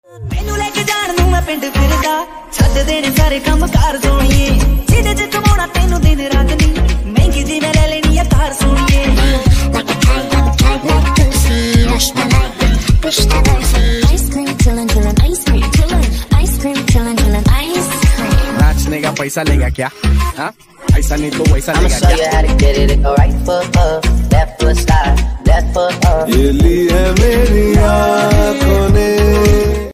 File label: Mashup panjabi ringtone